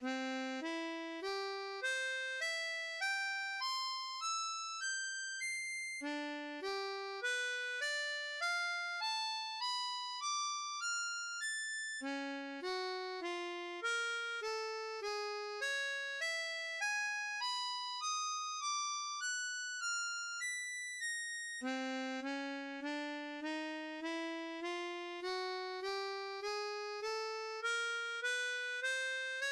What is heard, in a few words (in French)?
gamme harmo diato c chromatique